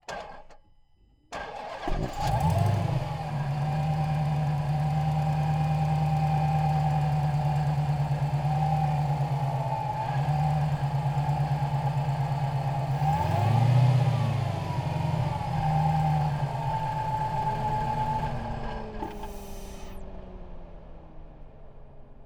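Dodge Challenger Hellcat Onboard Start Slow Drive Short Off Engine; MKH8020; 2015; Pole Position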